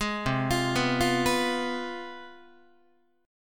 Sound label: C Major 7th Suspended 4th Sharp 5th, down, Guitar; CM7sus4#5 arpeggiated; 11,8,8,9,9,10; JGuitar